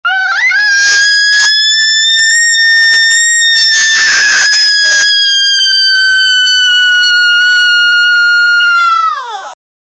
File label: family screaming; sound effect